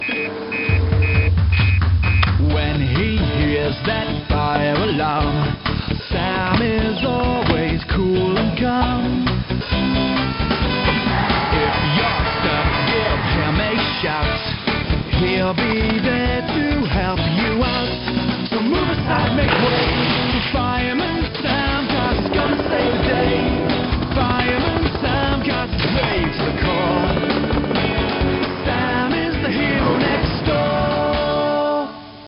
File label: Music Main Menu; Fireman Sam Always on Duty; NDS gamerip